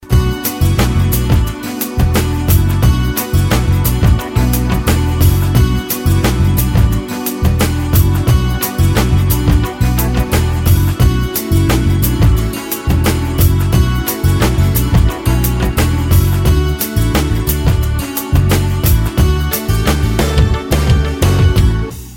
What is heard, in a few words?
wonderful chill out